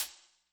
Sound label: hihat; pasific; Pop